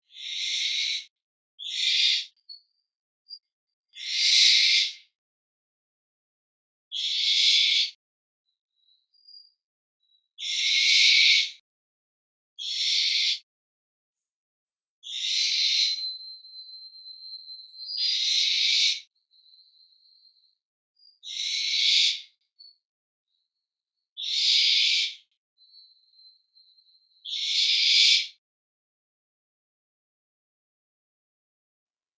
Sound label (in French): Chouette effraie, oiseaux de mayotte; oiseaux terrestres; faune de mayotte